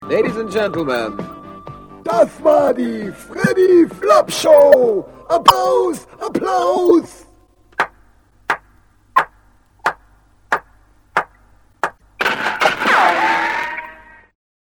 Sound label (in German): applaus; kehraus